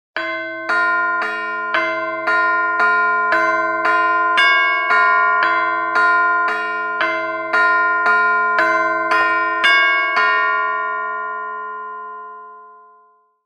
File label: halloween bells ringtone